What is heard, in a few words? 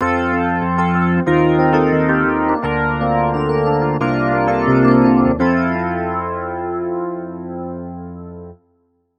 epiano digiwave, Clavia Nord Wave; FM/digital sounds